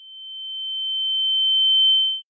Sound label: ring intense; Apotheorasis